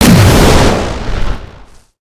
Blaster Blast; Overcharged

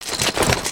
Dance; Skullken; creatures organic

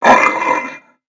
Pet Dog Robotic Angry; creatures pets; Lego

